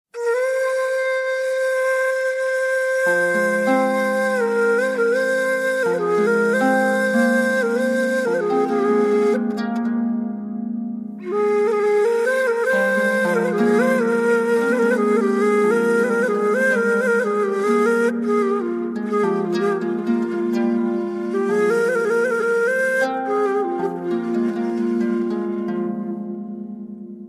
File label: Spiritual Flute